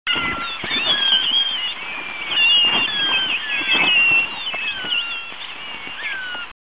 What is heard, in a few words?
dolphin